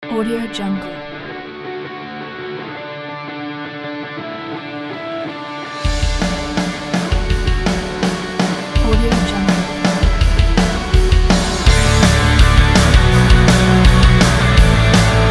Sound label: punk